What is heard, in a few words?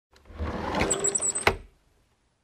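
Squeaky drawer opening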